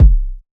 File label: round bass drum one shot c key, Royality free kick single shot tuned to the C note.